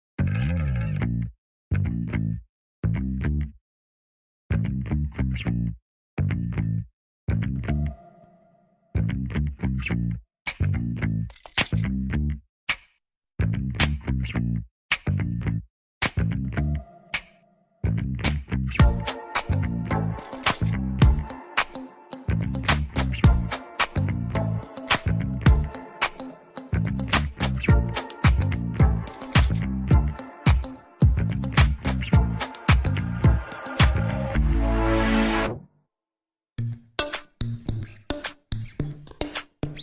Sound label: INSTRUMENTAL